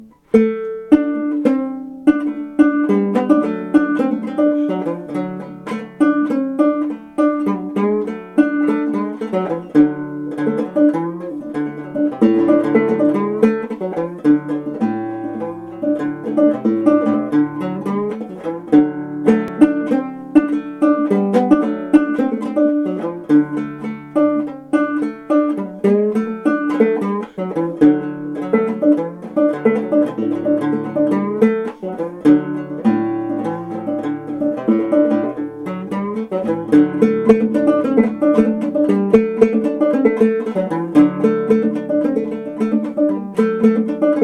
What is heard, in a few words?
three old hens, gourd